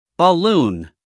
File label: balloon